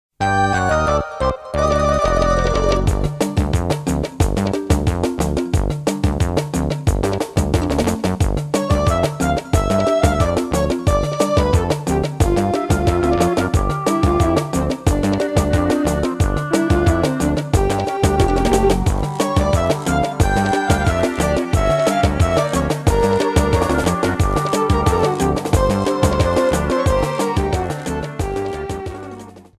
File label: Music; Mario Party 3; Spiny Desert